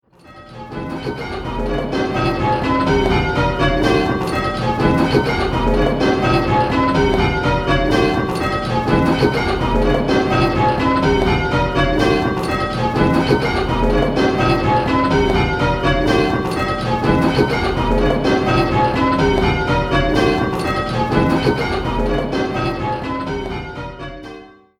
8-Bell, bells; What place is the treble in?

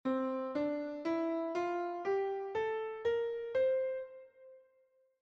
Mixolydian, mode, bells

